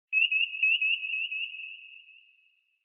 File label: Sonar; Star Trek